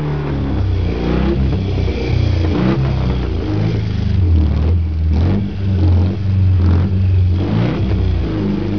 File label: MOTOR